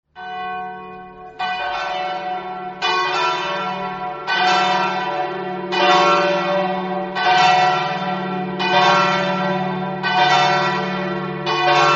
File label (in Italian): pasiano, campane